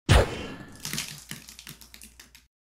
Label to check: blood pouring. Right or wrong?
Right